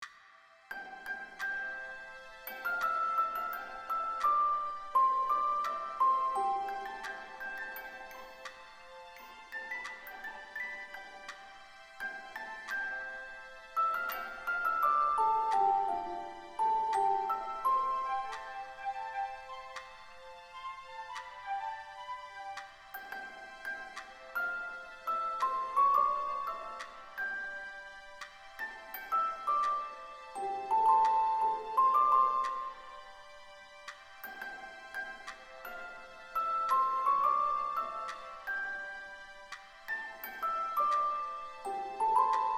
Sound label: Lullaby; AfterStory; Doki Doki Literature Club